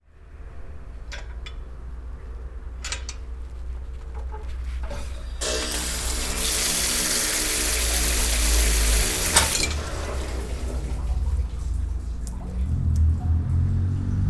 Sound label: public water pump sergiyev posad